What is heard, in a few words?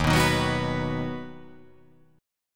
D sharp Minor Sharp 5th D sharp